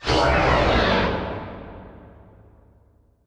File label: Prop Warehouse Prop Rack Stop Close; Titanfall 2; Boomtown